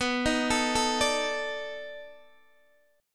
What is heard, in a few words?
B 7th Sharp 9th, down, Guitar, DADGAD